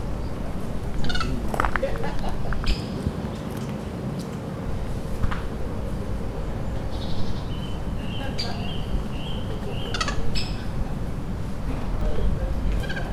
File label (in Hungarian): vöröshasú barnamaki; szegedi zoo 2011